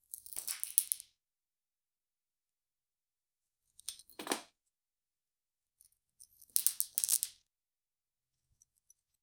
remove buckle shoes on co, buckle

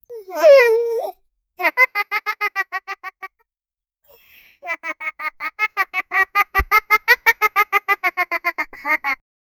a little boy laughs slyly

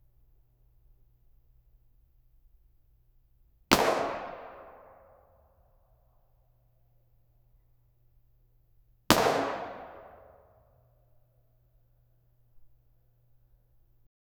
shot gun small room; close range

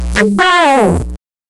minimalist ui sound clean; notifications

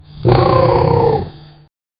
the sound of a lion, animal roar

